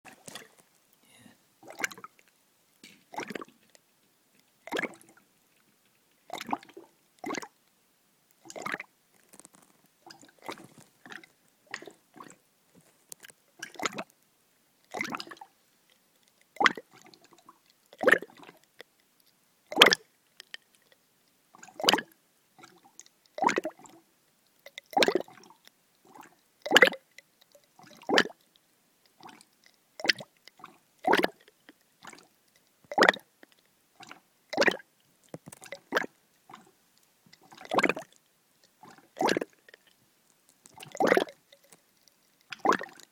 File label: cider bubbles